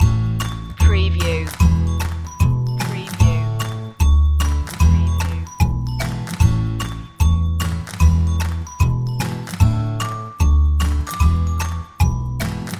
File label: yip jump loop